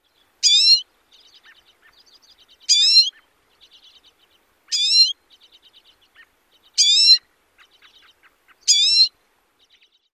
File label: nasal calls; AK